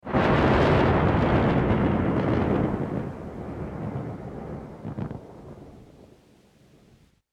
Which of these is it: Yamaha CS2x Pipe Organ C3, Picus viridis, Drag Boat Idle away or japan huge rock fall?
japan huge rock fall